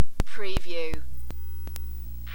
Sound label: Sony Tube TV Clicks Low Hum